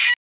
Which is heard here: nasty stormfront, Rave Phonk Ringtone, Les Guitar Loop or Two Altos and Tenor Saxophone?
nasty stormfront